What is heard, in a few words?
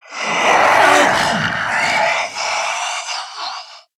alert, zomb female; nmr